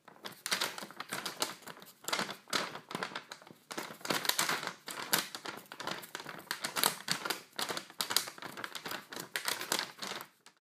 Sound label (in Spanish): Papel Volando